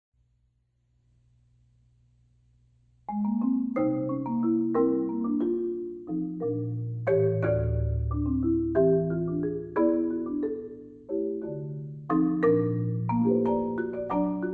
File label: waltz